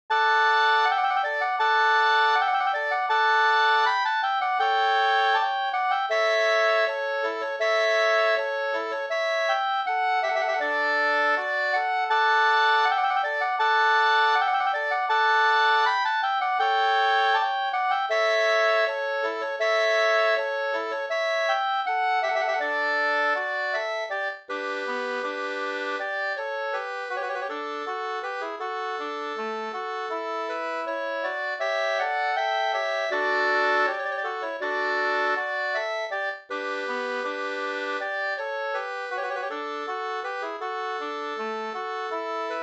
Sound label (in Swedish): brudmarsch; Sarna brudmarsch